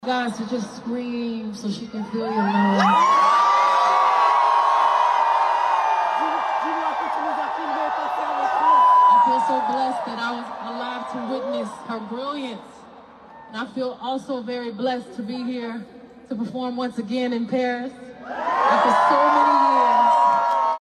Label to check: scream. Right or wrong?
Right